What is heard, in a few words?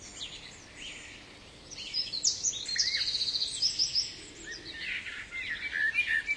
bird